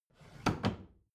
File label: bathroom drawer close regular